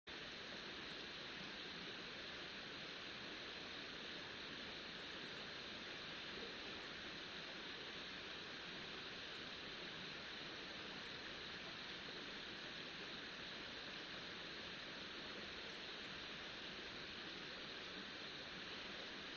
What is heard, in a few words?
riverside; Kyoto U; Ashiu